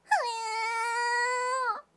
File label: fueen, kanjou voice